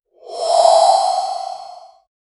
the sudden startling soun, surprise

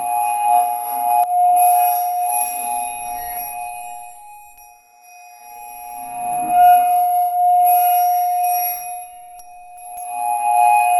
a bright crystalline chim

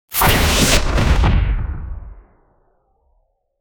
shiny logoweapon gleam

